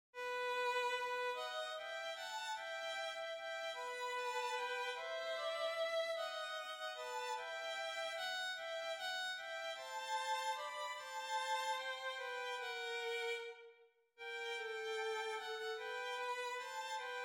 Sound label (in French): VIOLONS; Il est bon de louer